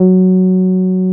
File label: TB-303